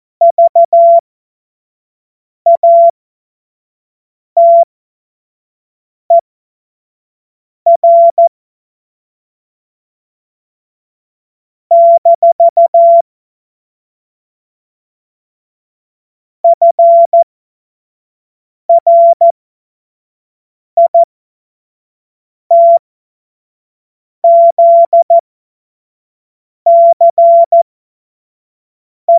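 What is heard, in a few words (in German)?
Witz, Morsekurs, cw morsen; Witze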